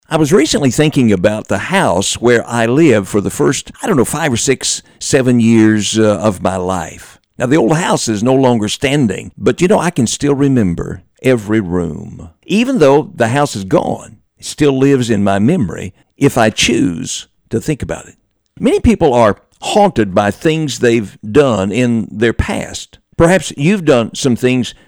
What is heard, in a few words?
bluegrass